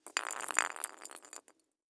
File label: Household Ball Roll On Cement